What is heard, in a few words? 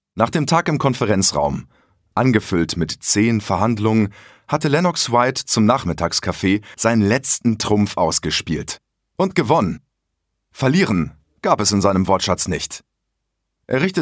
Basso Legno